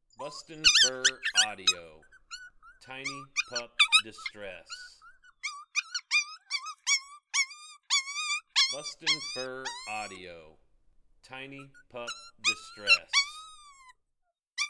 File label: Tiny Pup Distress